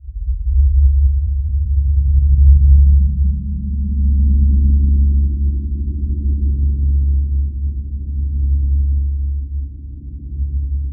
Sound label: Wriggle